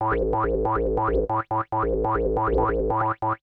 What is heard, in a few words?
SPACE SYNTH 140 A; SpaceSounds